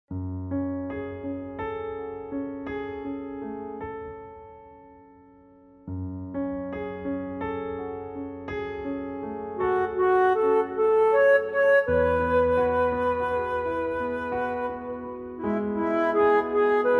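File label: flute, F minor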